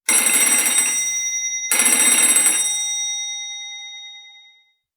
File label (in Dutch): bel wekker